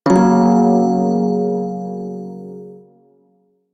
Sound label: Prime Shawzin Yo Chord A; Music; Warframe